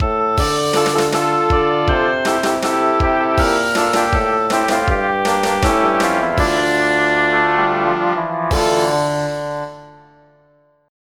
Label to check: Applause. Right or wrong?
Wrong